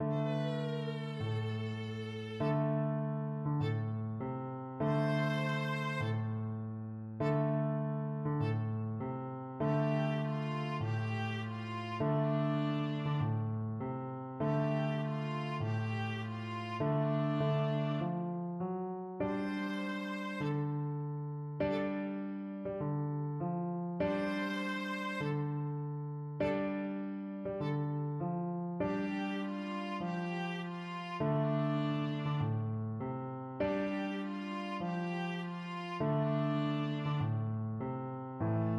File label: ground rock, viola